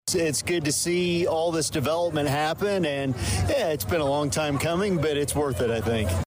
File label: mmmmmhh; Sonic